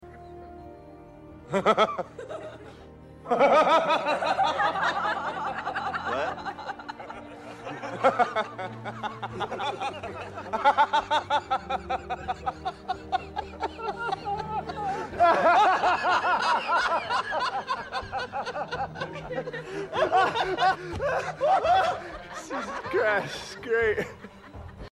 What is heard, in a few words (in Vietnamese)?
Giong cuoi deu